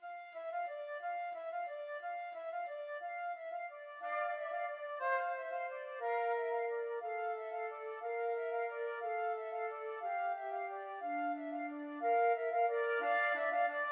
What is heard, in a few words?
carol of the bells flute duet